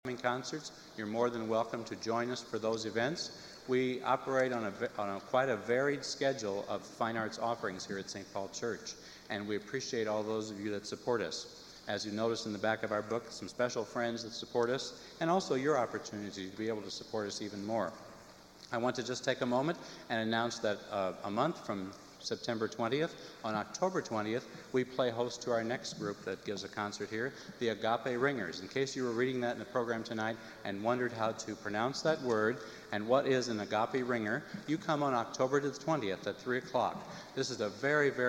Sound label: symphonic choir and orchestra; kiev; ukraine